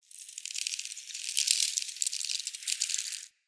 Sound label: Forest Dry Leave Move; Ambience; Midrath Island